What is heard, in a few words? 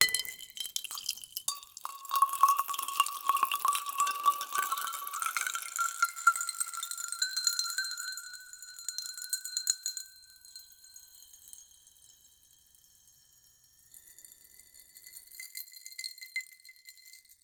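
create a sound where first, accidental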